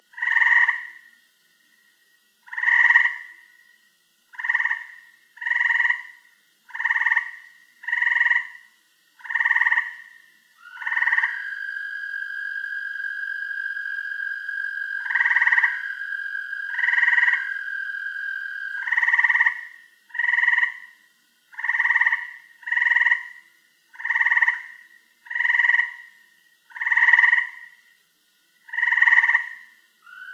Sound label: frogs